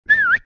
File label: whistle; English Prep Package; Rex Does Tricks